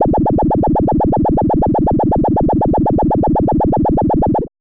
Bubble machine gun

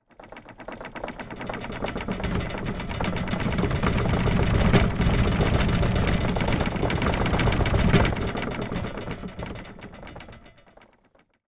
Rattle Shake Vibrate

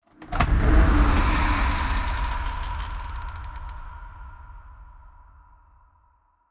Entering The Eerie House

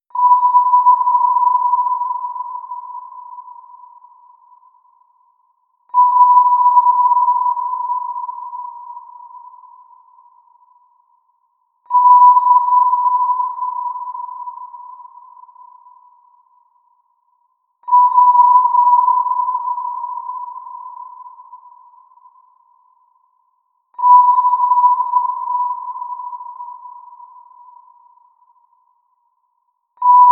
Sonar Wide

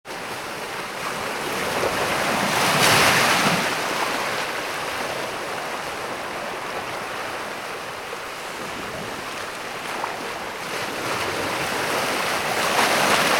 Light waves on rocks

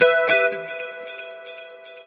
Em Bright Chord

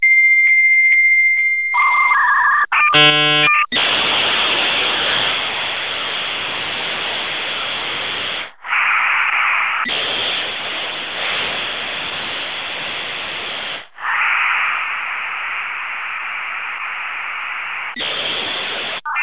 Fax

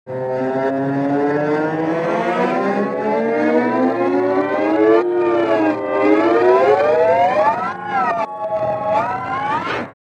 Slide

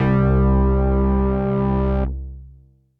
Nord Hard Organ C1

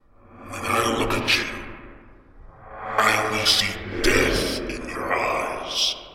Demon King

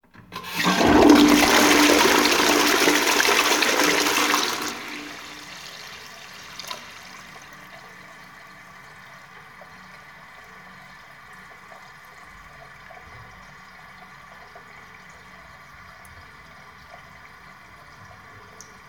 Toilet Flush

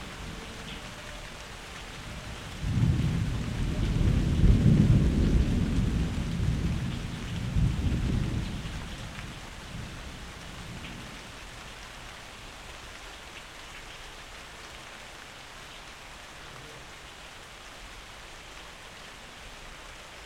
Rain And Thunder

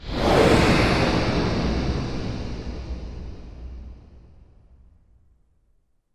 Woosh

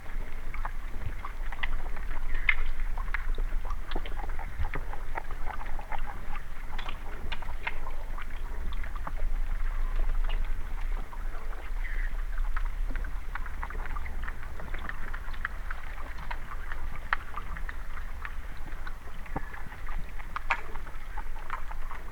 Channel Underwater Sounds

